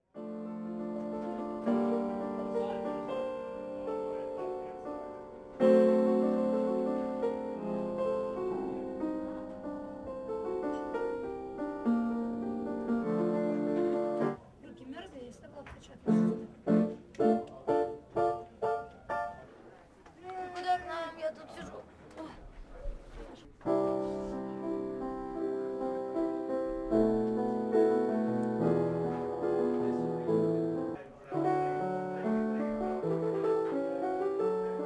Piano

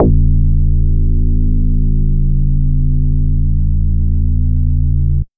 SPOOCKY, bass, 90bpm; Demo Multitracks

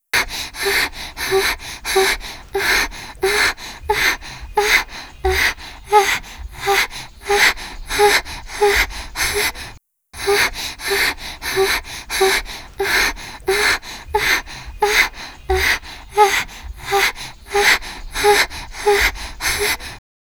Sigh

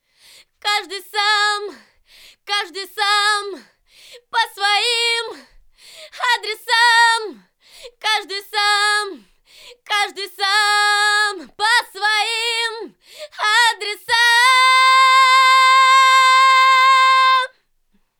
Scream, acapellas

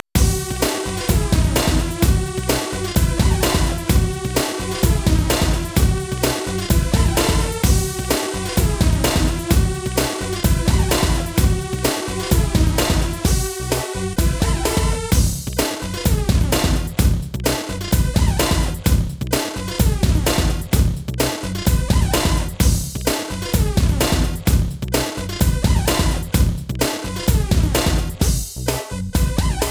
Instrumental

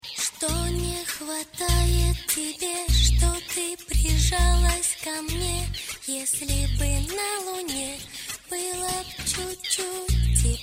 Acapella

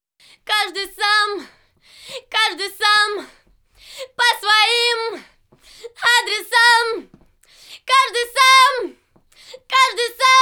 Scream, acapellas

